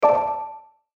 Reset Score

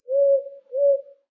Haunted

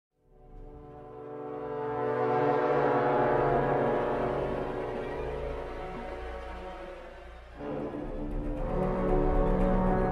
Suspense Theme

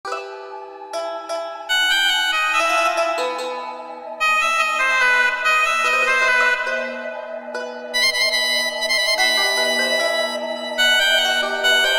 Ambient Music; Spider-Man Friend or Foe; The Oasis ~Ambient Music B~

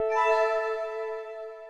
Fountain recover; super mombo quest

